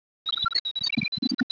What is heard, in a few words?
computer bizarre